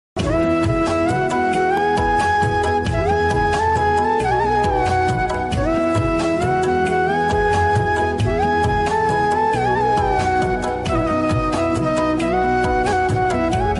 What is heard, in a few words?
flute bgm ringtones flute ringtone; ganesha; ganpati